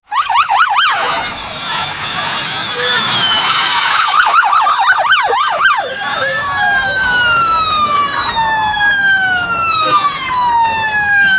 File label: a lot of police and